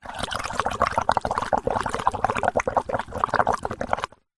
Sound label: drink bubbles blow glass water surface through straw